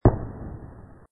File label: Hit; Hero in the Ocean